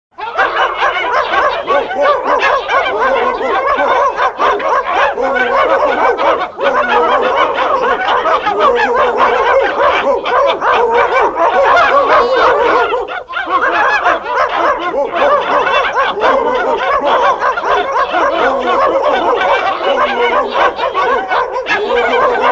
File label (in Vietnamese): tieng cho sua; mam non gia thuong